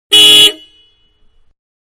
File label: Mid Size Car Horn Medium Exterior; Cars (Sound Effects:Vehicles); Manhattan Production Music